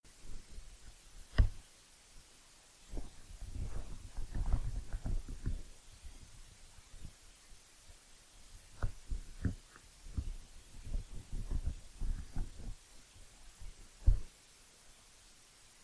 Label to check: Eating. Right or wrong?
Right